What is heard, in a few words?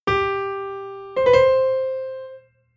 grace note